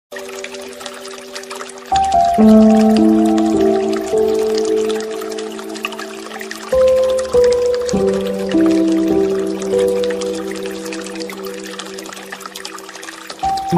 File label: water sound; relaxing song sleeping with sound water